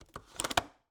Cabin, window, latch, flip, lock, close, hyper; Eiravaein Works; Vaeyan IV

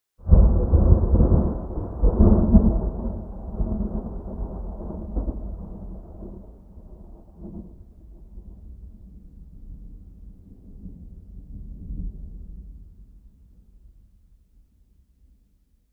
Thunder echo; boom library; Thunder And Rain

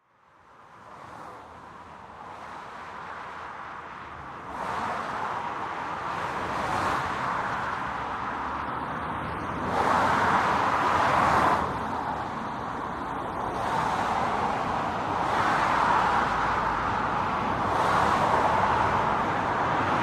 ring road; Pitch Black - A Detective's Demise

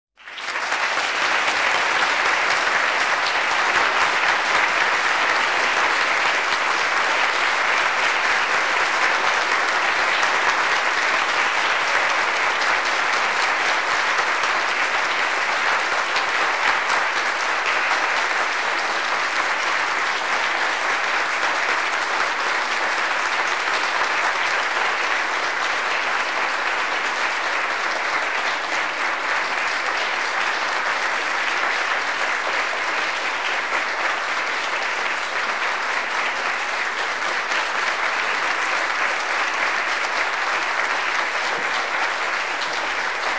Applause 80 to 100 People; KMP Film Video Sound Effects

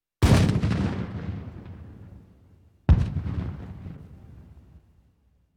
Military vehicles; Valentino Production Sound Effects Library CD50

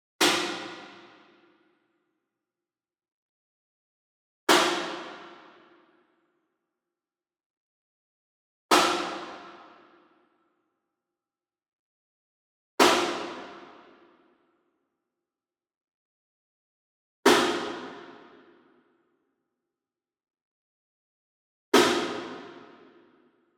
SINGLE SNARE Pearl Marching Hit Rim Far; boom library; Cinematic Strikes Construction Kit

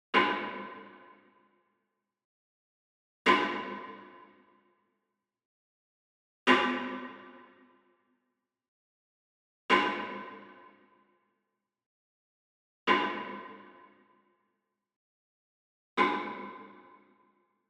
Wood Percussion Log Drum Hit Far; boom library; Cinematic Strikes Construction Kit

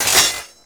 Pickup Armour; Pickups

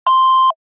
Sci Fi Beep; 1001 Sound Effects

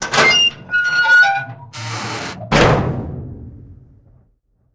dungeon exit open; DeadFright

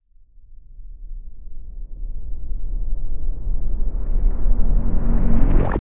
RVRS, Cinematic Metal Impacts; boom library

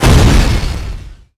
Explo Small Bomb; Destruction

